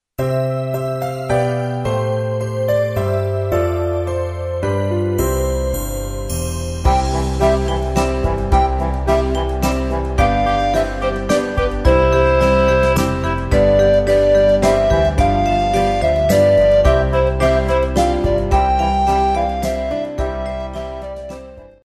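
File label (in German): Kuckuck, Blockflöte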